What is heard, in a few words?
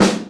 tight lows acoustic snare g key; snare drum samples